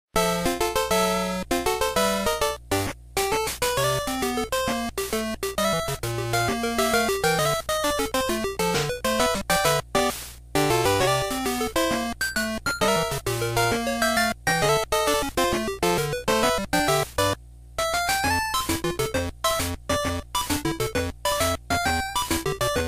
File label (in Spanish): Tablero azul Pinball